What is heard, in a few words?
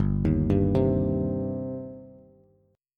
G Suspended 4th Sharp 5th G, down, Bass; Gsus4#5 arpeggiated; JGuitar